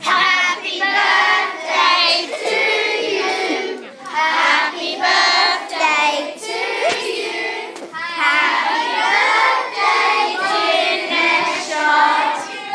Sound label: birthday song